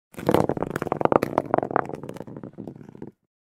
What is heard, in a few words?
Rotation sphere